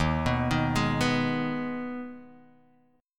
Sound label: D sharp Suspended 4th Sharp 5th, Guitar, Drop D; chord shape